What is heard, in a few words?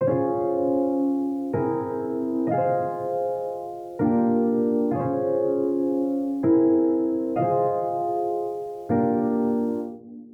Chords Full Dancehall Afrobeats; midi wizard